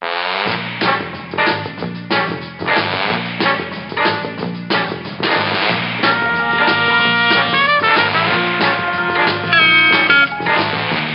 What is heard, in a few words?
Fire Sale Jingle; Kino Der Toten